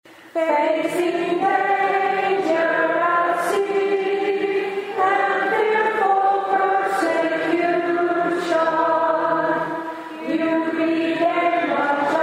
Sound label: hymn; saint paul